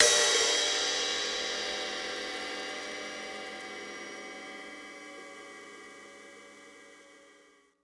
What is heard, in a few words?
crash; eddyflux; dough-samples